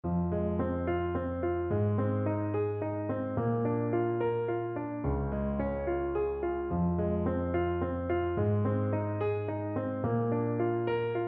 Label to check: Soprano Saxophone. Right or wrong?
Right